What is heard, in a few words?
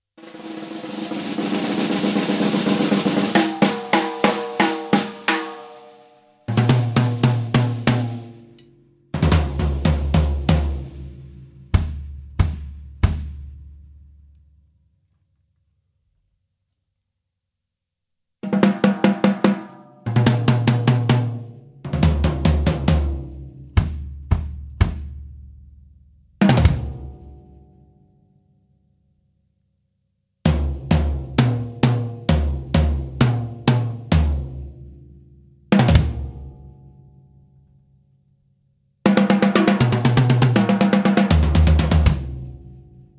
solo high tuning